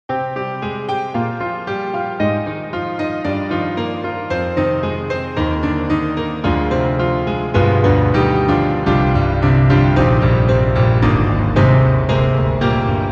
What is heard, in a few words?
Power Grand; reFX; Nexus2